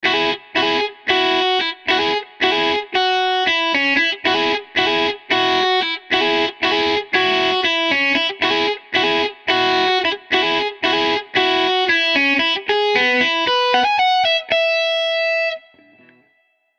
Hollowbody; Goggles

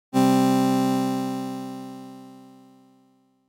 Synth With High Release

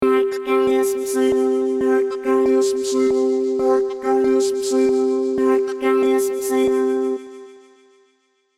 granular synth

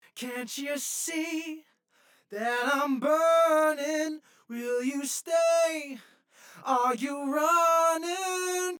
Stereo Vocal Haas

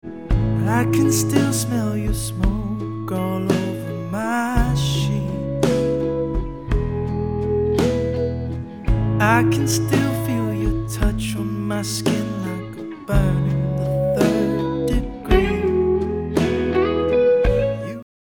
Room Verb; Tsar

